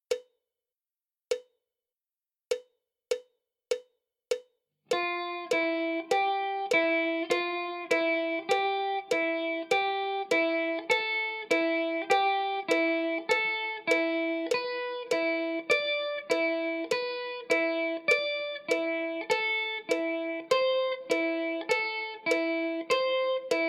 1 String Exercise, guitar

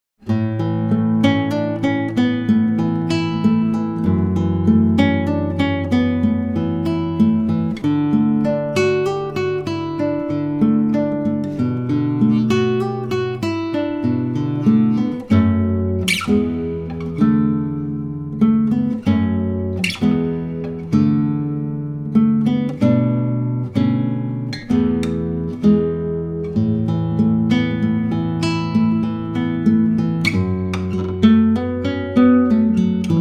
Fingerstyle Guitar; Fingerstyle Guitar V2

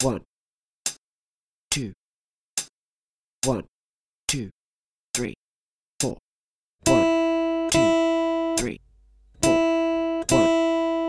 Long Notes Ex B, guitar

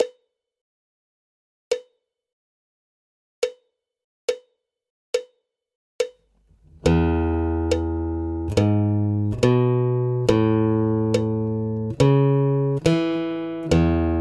Easy Guitar Riffs Slow

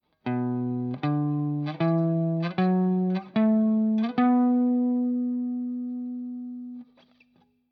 B Minor Pentatonic 1 String, guitar

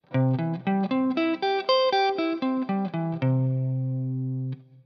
C Major Arpeggio Shape, guitar

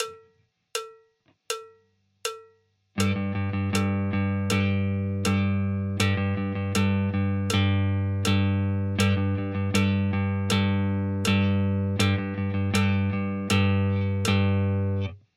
rhythm, guitar

